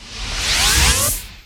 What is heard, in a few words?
Skill Arrow rain; FlyForInsanity